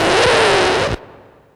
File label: X SCRA R, RECORD-FX; XP RECORD-FX; Best Service; XXL Production Effects